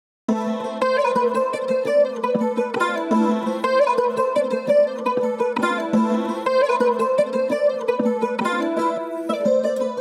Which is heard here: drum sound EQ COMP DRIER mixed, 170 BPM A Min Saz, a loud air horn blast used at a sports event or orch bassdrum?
170 BPM A Min Saz